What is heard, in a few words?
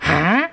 combo break